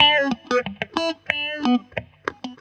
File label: GTR, Roots Funk; USB Soundscan vol.41; AKAI